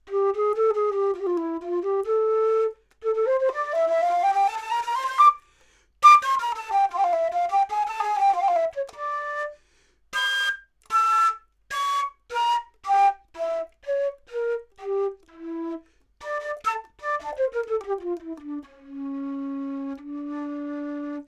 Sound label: Flute, 40cm High Mid